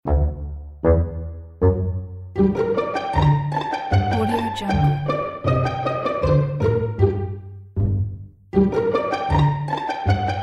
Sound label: sneaky pizzicato and bassoon comedy music